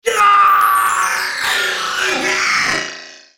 dying; CyberEzreal

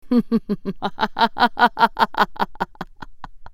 laugh; MetalWingedJudicator; Character Voices - English

